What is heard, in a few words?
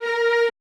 QVIB F#A3, Orchestra; AKAI Advance Orchestra Upgrade 97 Vol.1